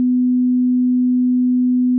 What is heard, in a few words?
sine 250 Hz, 250 Hz sinusoid (2 sec)